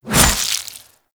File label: Deco Hover Whizzby; Envy; Warframe; Warframes; Pax Duviricus